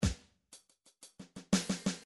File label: midi drums